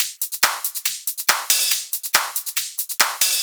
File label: drum Loop 140BPM; Multi Loops